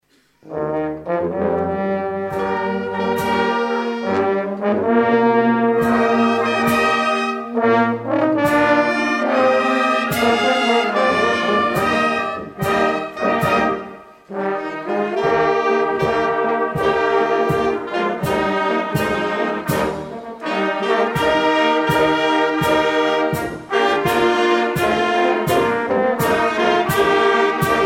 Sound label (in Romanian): Fanfara; Duminica seara; Paste